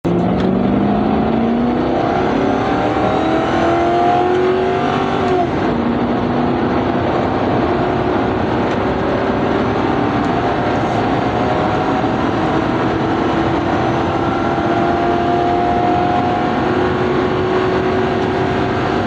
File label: car sounds ford high speed